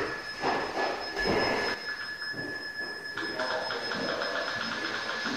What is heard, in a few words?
growl upstairs small bedroom